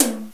Rock Tom; GMPlay; XG

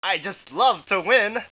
very happy!; Spades Deluxe; Hal

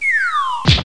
YOYO; Mobiclic; langues

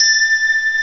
Pad (A5); X-Wing Demo; X-Wing Patches

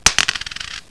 Coins 01 Cents

